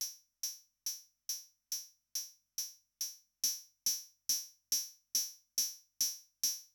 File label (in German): Metronome ohne dann mit AC